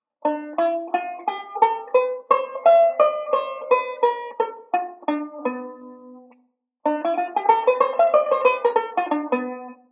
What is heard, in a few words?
Modal Sequence; Beyond Chord Melody Volume II